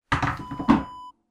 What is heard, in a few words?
water basin turn around